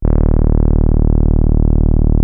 TAURUS E2, synthesizer

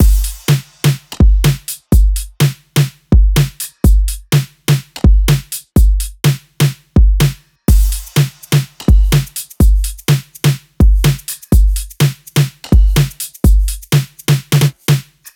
loop full 125; Seismic Loops

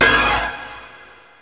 Kawai r-50; Drums

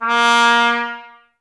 Trumpet A#0; Miroslav Vitous - Solo Instruments VOL-1; 90 s Sample CDs

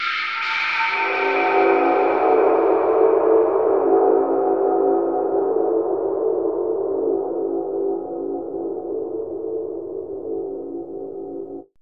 MYSTERY, AMBIENT; AMG - Vince Clarke Lucky Bastard

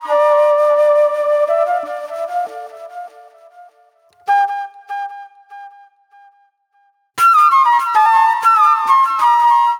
Acid Trip Flute

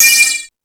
Crash, Drum Machines E-MU Pro-Cussion

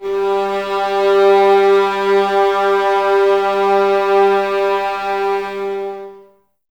VS G 3, 11 Violins, Mute; Miroslav Vitous; String Ensembles

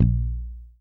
SA4MUTA#1, Bass; Sampleheads; Will Lee Bass Library

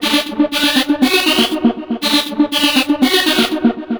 Trance Synth

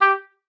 G4, OBOE; Xsample VOL-6

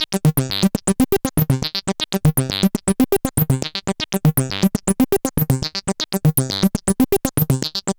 Synth Sequence; Sonic Foundry (Sony Creative Software); Prototechno

